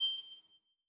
Rose Hover; netent

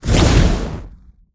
bonus Arrow Shot Fire; netent

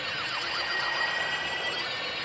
Excitement; netent

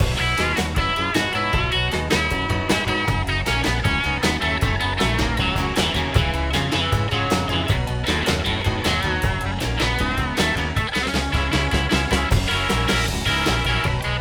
main ambience

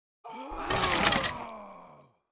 shield Wall Idle; netent

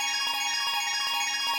win big win count up; netent

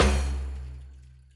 spingle Drum End; netent

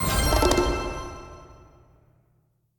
win small

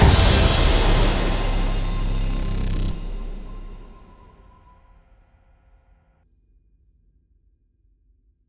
Big Win End smash; netent

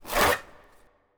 Win Rip; netent

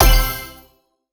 win land

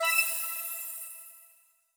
Wild Sound; netent

